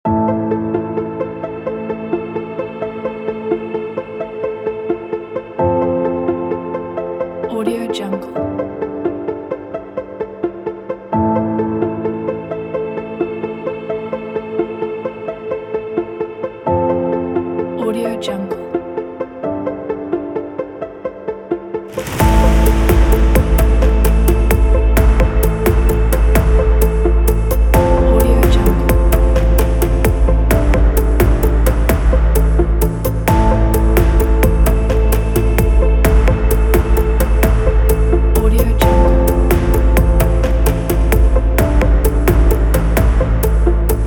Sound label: future dramatic